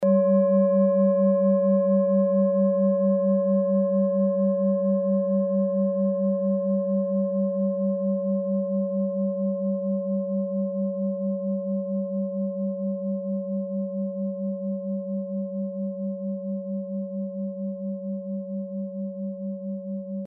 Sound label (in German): klangschale ladakh